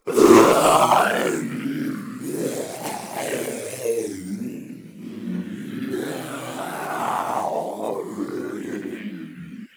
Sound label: crowd